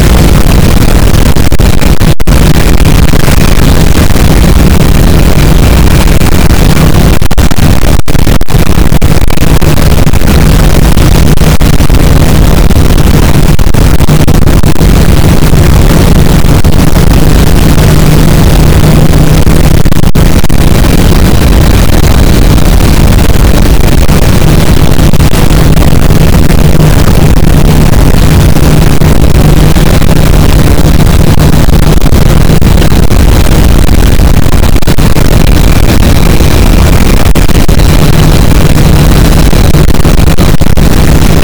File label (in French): cachalot positif, boat; Physeter Macrocephalus